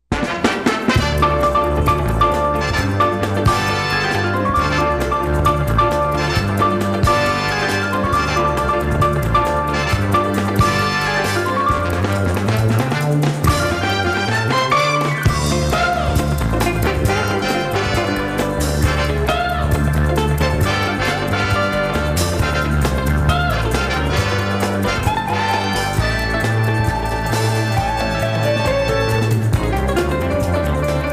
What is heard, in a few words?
Bonus Prize Rock Music; The Joker's Wild; Game Show Sounds